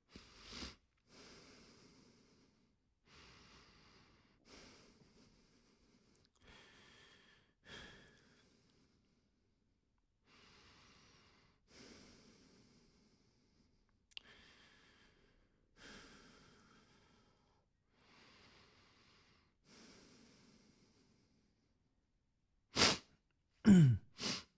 Breathe through nose

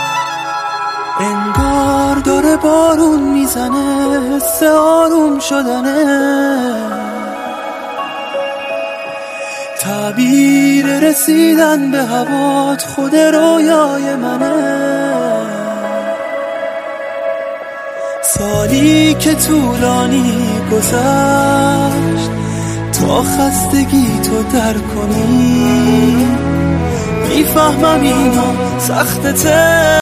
Honeymoon Ringtone

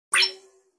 Science fiction notification; نوتیفیکیشن علمی تخیلی

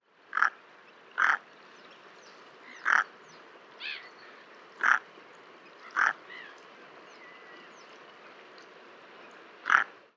Typical mergansers, مرگوس

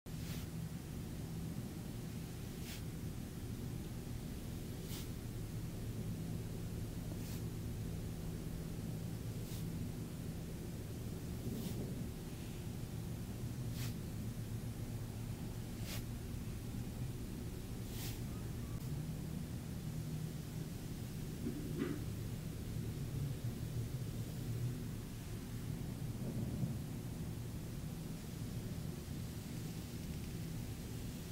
Brush on skin; کشیدن براش روی پوست

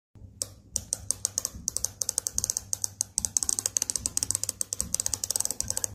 Ratchet wrench; آچار جغجغه

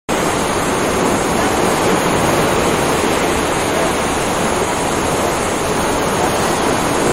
Valley

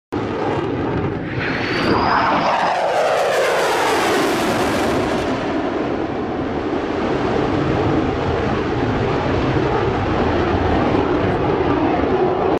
F 22 Raptor; رپتور

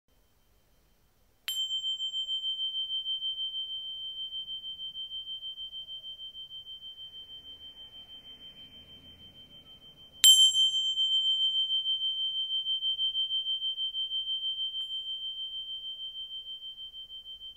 tingsha

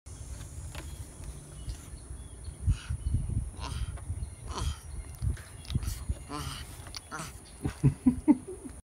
crested porcupine; تشی کاکلی

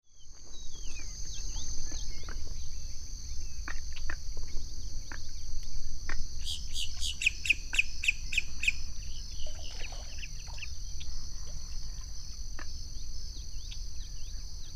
King rail; پرنده یلوه شاهی

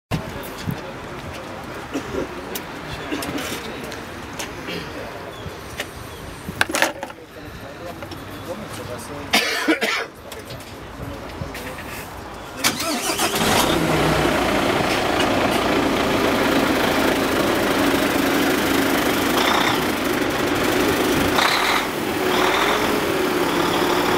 FH16 750 Sound

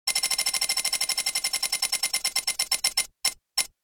wheel of luck

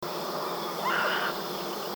Snowy egret

